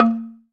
Bamboo Marimba A#2; Multisamples; Digital Sound Factory Planet Earth

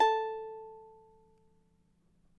Charango A 3

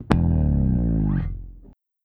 FALL E1, BASS, EFX FALLS

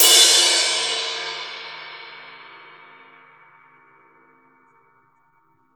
Raw Crash, Drums Acoustic

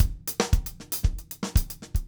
SAMBA, Steve Gadd Drumscores

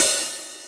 Hi Hat Open; Multisamples; Digital Sound Factory Planet Earth

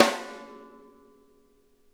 SNARE; Sonic Reality; Interactive Drum Kits

